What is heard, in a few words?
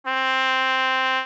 trumpet